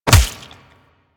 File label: Melee Fist Impact Flesh; Warframe; Weapons; Fists